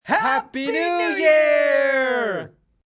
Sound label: Two Men New Year, Cheering, small group; Party Sound Effects; VoiceBox; Twistedtracks